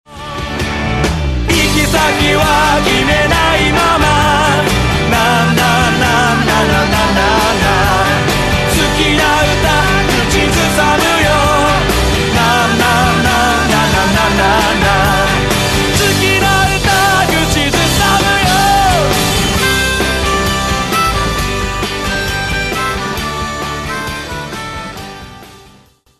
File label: music